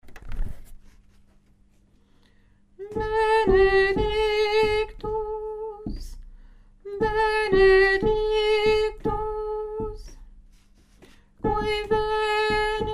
voix hommes; chorale

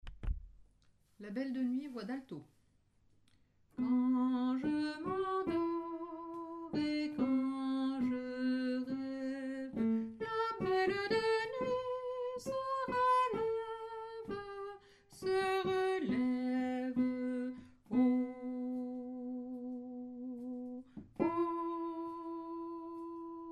la belle de nuit alto

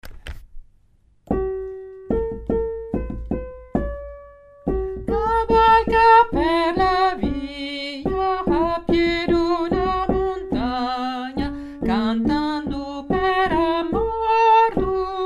basse, chorale

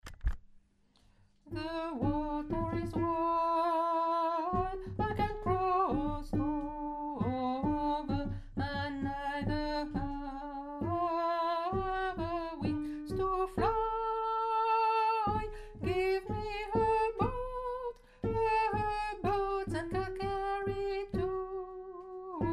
alto, chorale

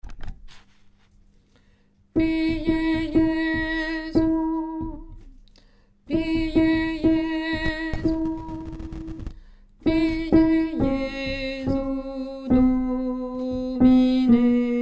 lettre B basse, chorale

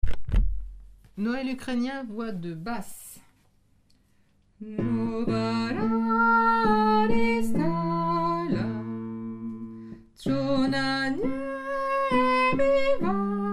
noel ukrainien basse

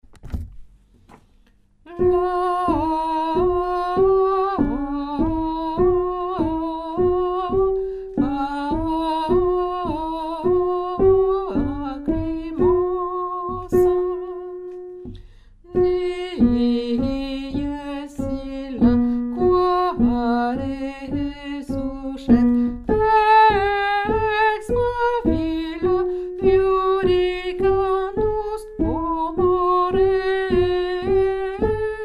lettre D alto; chorale

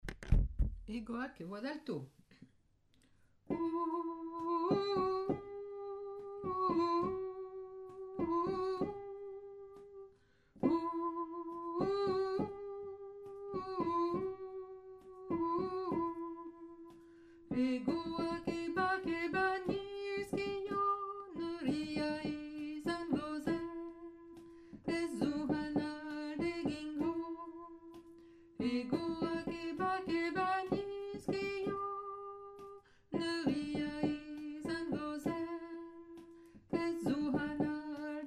alto basse

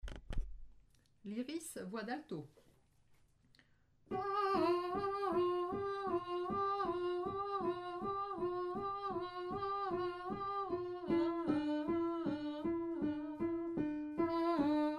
l'iris alto, choeur femmes

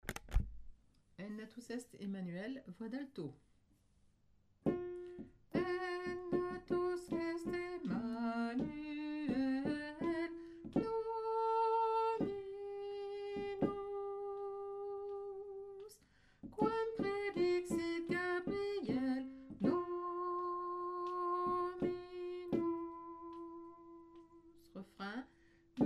alto; en natus est

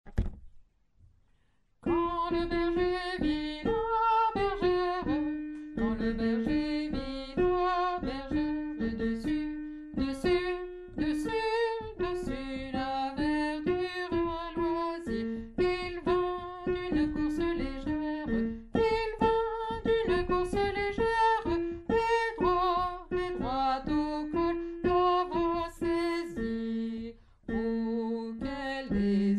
basse

